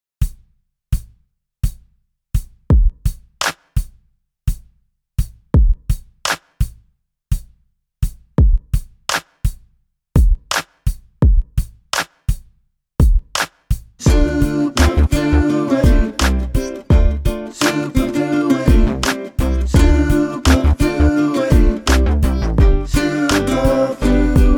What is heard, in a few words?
Offbeat